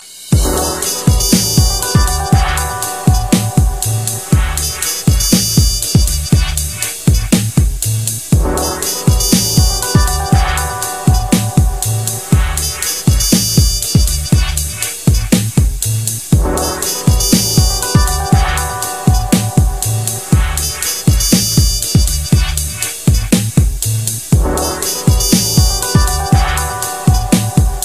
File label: jazz ringtone